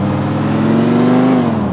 second, vehicles; schafter

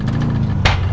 rev short loop, vehicles; emperor

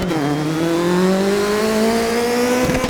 second, vehicles

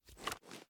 ar reload drum empty grab, weapons; viper

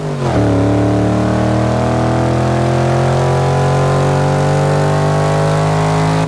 second, vehicles; dod ram 1500

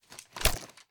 ar reload end, weapons; viper

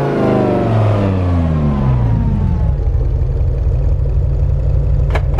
throttle off, vehicles; bmwm5e60

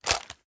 ar reload empty rotate; weapons; gmod; viper

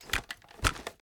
reload empty end, weapons; gmod; viper

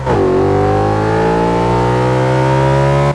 second, vehicles; gmod; tdmcars; rs4

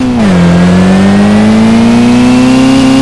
2nd gear, vehicles

